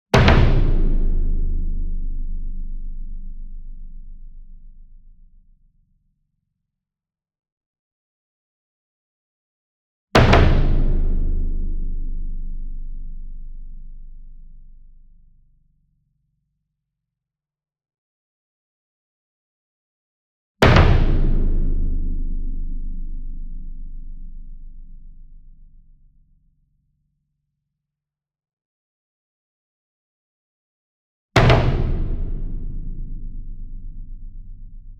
SINGLE DRUM Bass Drum Concert 40 Inch Double Hard Beater Medium; boom library; Cinematic Strikes Construction Kit